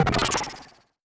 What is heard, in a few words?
weaken